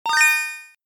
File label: chord high